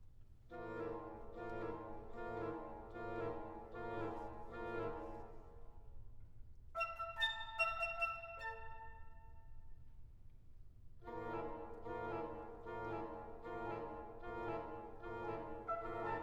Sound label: Etudes for orchestra, Excentrique; Stravinsky; Four Studies